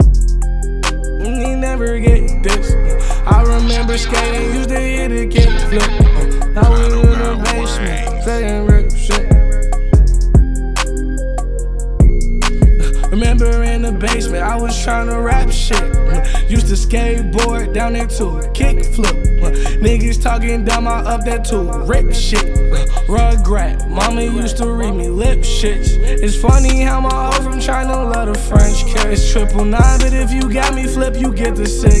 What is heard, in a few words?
kicks